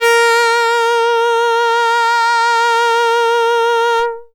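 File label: Box Cello DX100 A sharp 2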